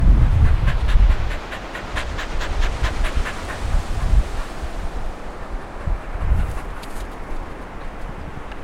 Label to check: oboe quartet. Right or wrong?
Wrong